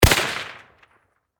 Warfare Rifle M1 Carbine Semi Single Shot Close Perspective; Rifle Sound Effects; SFX Bible 6